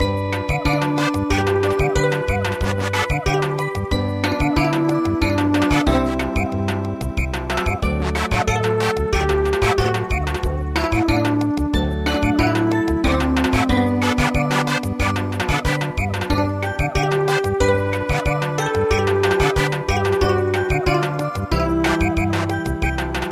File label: Samba